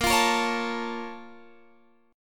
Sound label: Bb Minor Major 7th, Guitar, Open F; strummed